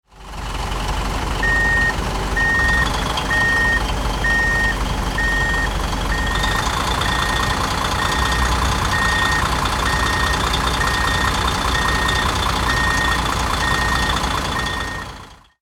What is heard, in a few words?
Bulldozer movement backup beeper